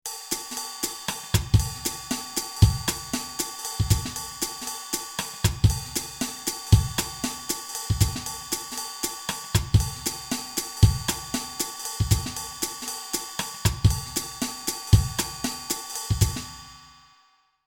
Jazz

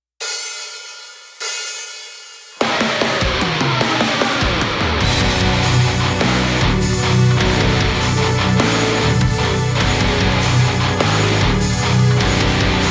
karaoke

